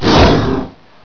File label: gator flinch; gearbox